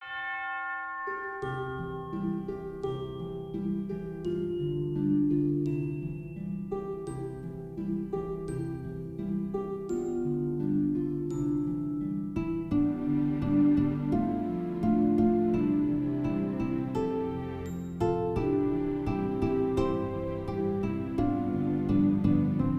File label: Dutch Christmas Medley